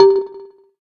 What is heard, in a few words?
electric, keytone